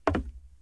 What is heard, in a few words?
wood, footsteps, survivor, run